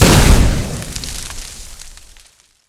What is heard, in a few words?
incinerate impact, fire; impacts